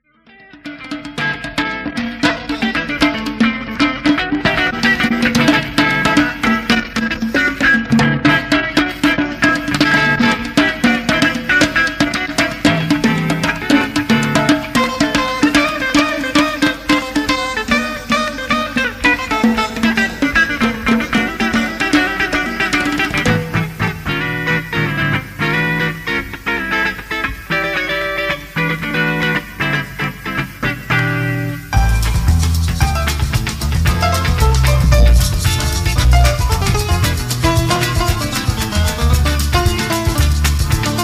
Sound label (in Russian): instrumentalnaya kompozitsiya